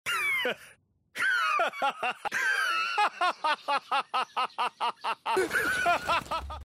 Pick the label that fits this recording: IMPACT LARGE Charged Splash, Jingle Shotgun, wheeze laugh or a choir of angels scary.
wheeze laugh